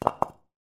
brick land

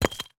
brick break

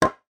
rotary

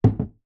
wood iron land

